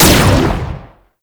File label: gunbrella shot 3D; Weapons; Warframe; Tenno; Koumei